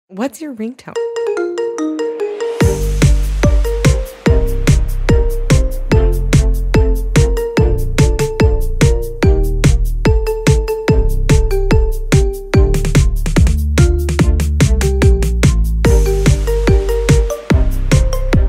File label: marimba remix